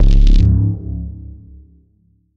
rad pulse

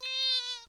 fox, animals

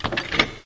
gun mortar reload; civ13; civ14; RMC; Weapons

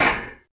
metal grate, Footsteps; Plating

